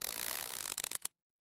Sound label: cartoon stretch